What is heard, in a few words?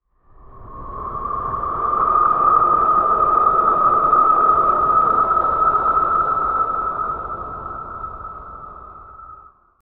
drowned in twilight darkn